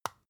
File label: floss open soft; haus-garten; badezimmer